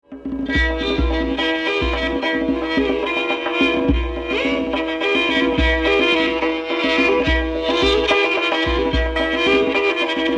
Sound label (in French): violon